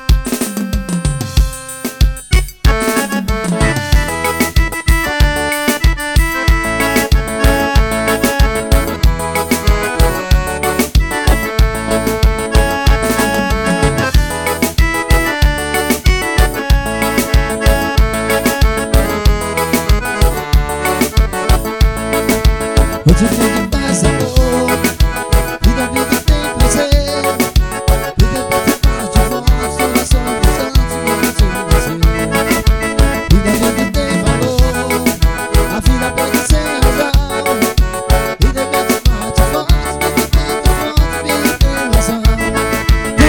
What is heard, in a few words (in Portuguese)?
forro